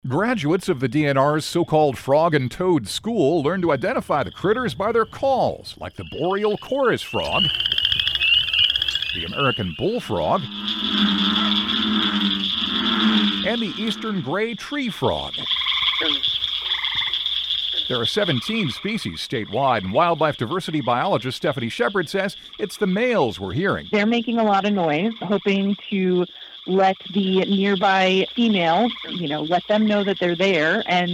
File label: frog, toad; dnr looking for good listeners to help track toads and frogs